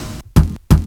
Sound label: DRUMLOOP, Ragga & Dub Reggae